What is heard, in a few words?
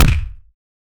16 bit punch sound; blip